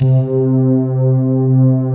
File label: MALLETS, JV 1080; Pro-Rec - Synthsonic